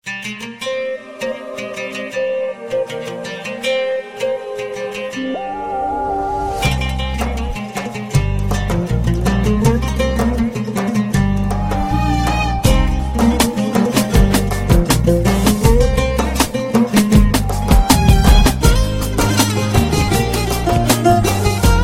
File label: arabic lute ringtone